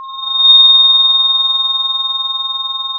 pop, Sound of a phone ringing

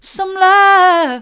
some love soft, vocal

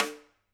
Rim, drum

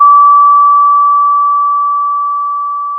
Sound of a school bell

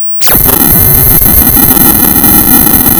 Sound of tearing paper, center

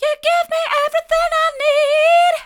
mid, vocal

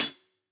close Cl Hat, drum

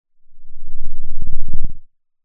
pop

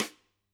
Sd St, drum

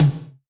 Tom, drum

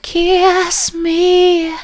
kiss me soft, vocal

